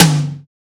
Tom D; samples

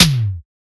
Tom A; samples

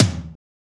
Tom E; samples

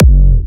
Big Kick; samples

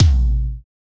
Tom A; samples

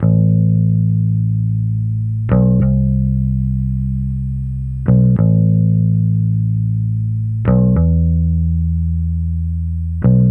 Bpm93 A Joyriding Vinyl Bass

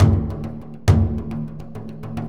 Powwow short, Snares

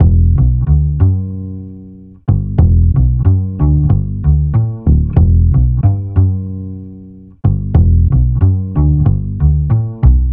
Bpm93 B Vintage Jazz Double Bass

